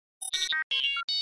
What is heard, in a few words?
Device Act Button, computers